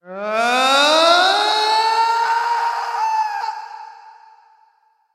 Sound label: fall; lucio